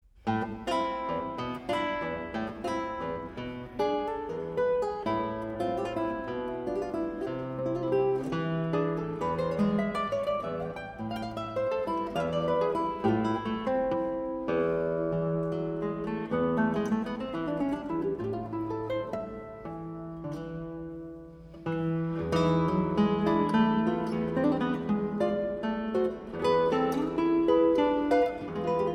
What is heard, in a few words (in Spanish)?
guitarra